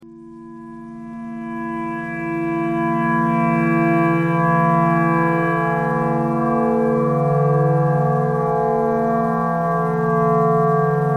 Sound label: clarinet thread noise